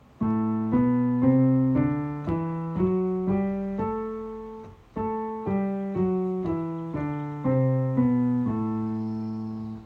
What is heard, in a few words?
dorian piano